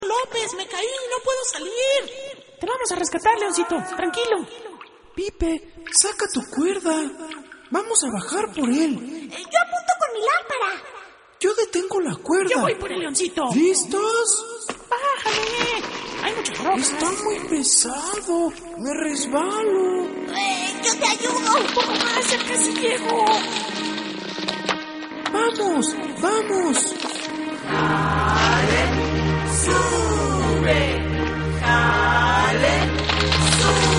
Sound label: pipe